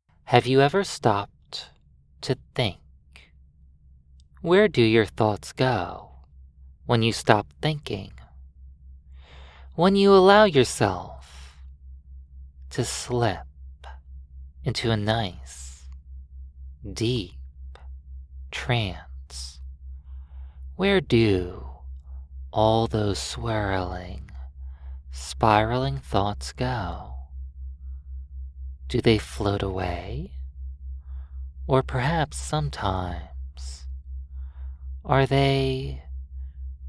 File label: Binaural Beats